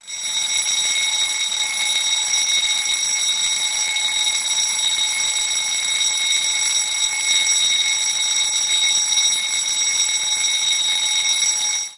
Fire Alarm

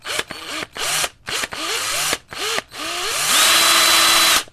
Drill

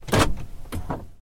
Door Unlock

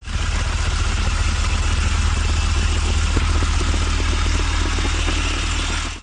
Tank

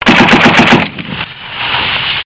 50 Cal Machine Gun

